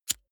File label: lighter wheel spin